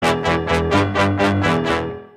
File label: duel request; gmod; darkrp